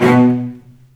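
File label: B1 arco short cello, left; East West; The Ultimate String Collection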